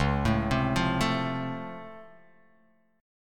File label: C sharp Augmented Major 7th down, guitar chord